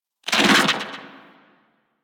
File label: Rifle Charged Tail; Warframe; Infested; Quanta